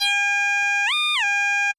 Beat

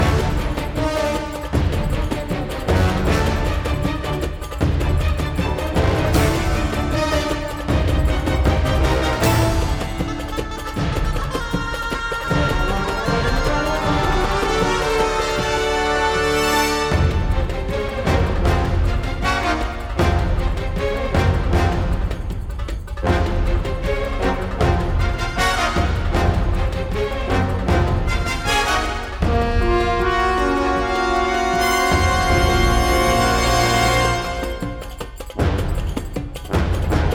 Loop

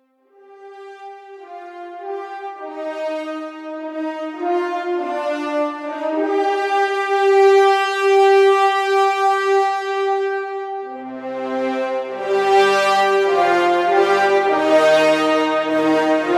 Storm Coast Explore; Storm Coast Explore Intensity 05

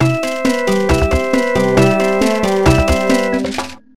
Sting Storm; simanimals africa; nds

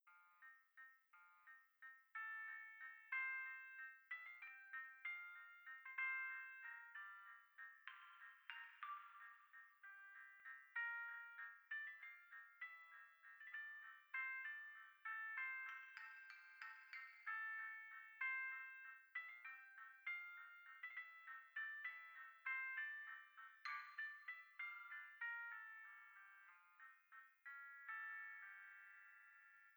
Merry Go Sound; Tracks Toybox Edition

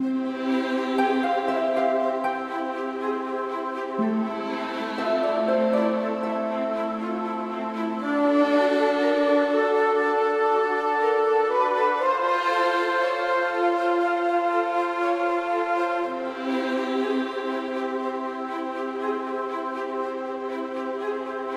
Bgm - Bonus Level Music - Night

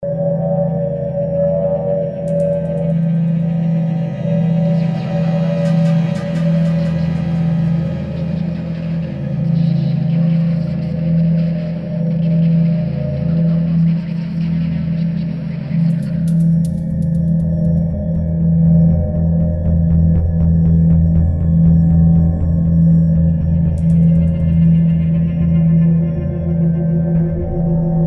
loading screen

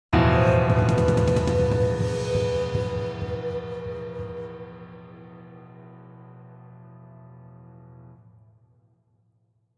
Ice Caverns Change Area Stinger; B Path of the Teal Lotus

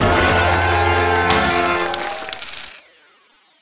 Sound of applause and coins magic stars; Mr. Blaster

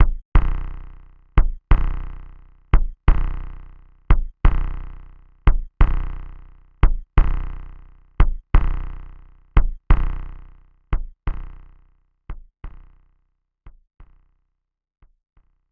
Heartbeat; BS Wapaaz Souryu Hen; SNES

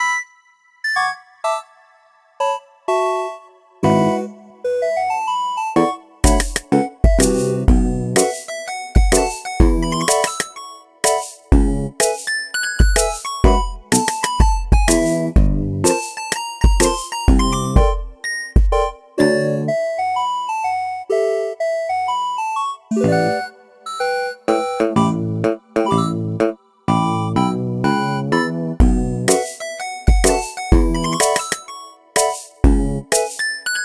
subgame dance song rehearsal; Vitamin Connection

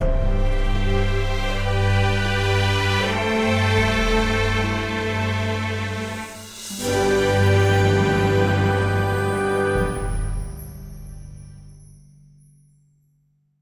Success Sting; Jurassic Park The Game

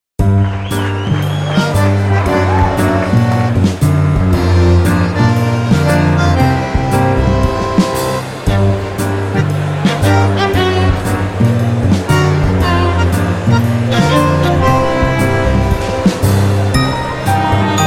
Tango; Tango with Dice; Game of Dice; Theater of Fate

